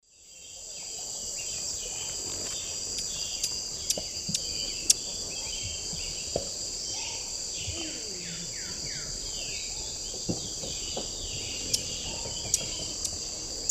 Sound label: Chlorostilbon mellisugus, feeding calls; S. America; Eastern Lowlands